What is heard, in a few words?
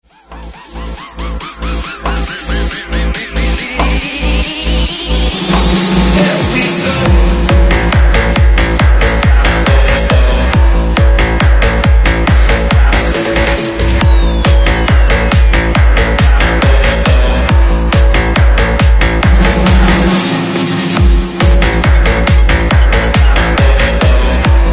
Bounce 138Bpm; DJDOLLS; Redrum